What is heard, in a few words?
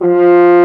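French Horn mf F2; Digital Sound Factory Virtuoso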